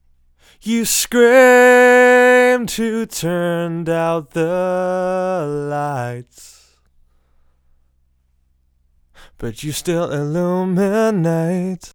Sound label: vocal original dry